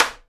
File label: SIDE STICK, Live Snares